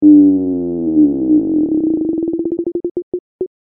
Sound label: chant